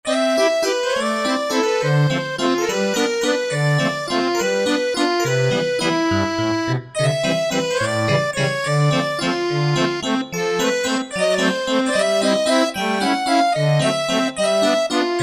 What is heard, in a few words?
French Tines, Korg TR-Rack